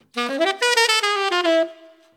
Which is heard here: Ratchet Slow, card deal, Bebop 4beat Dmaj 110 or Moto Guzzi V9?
Bebop 4beat Dmaj 110